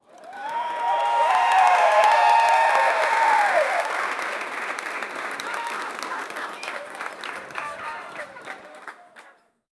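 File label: crowd applause and cheering, audience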